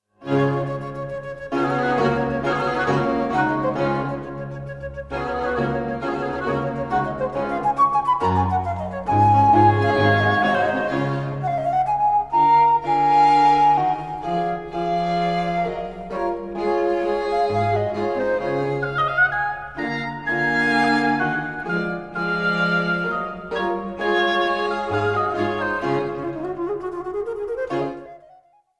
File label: quintet in d major for flute oboe violin viola and bc